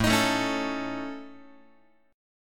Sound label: A Major Flat 5th, Guitar, AMb5 chord; strummed; JGuitar